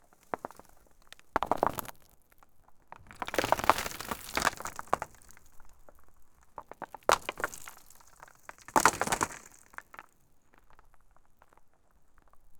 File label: rocks tumbling down a hil; hill